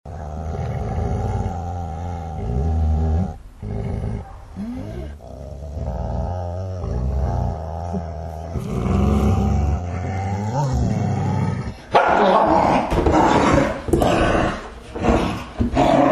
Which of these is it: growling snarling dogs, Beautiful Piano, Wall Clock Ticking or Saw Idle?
growling snarling dogs